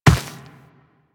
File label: Walk Dirt, Footsteps; Warframe; Operator